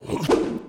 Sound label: Throw; Aporkalypse Pigs of Doom